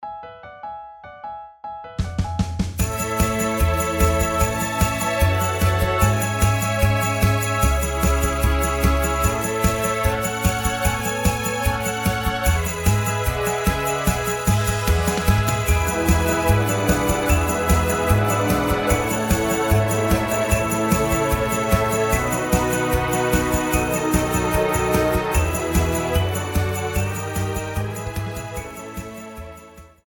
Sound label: Karaoke